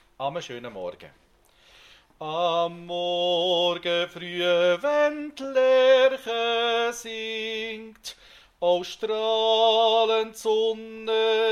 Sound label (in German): Bass